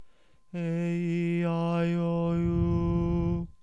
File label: aeiou Mid